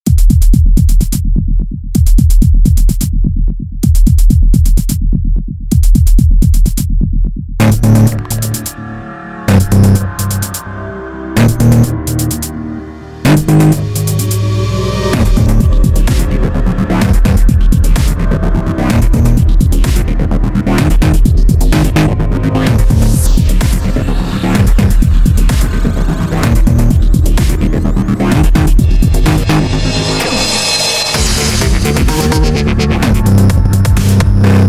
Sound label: theme; sven